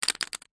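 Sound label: leisure poker chips several set down pile on hard surface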